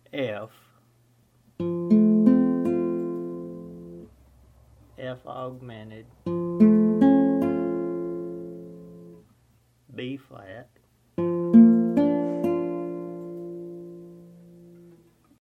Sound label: Using Augmented Chords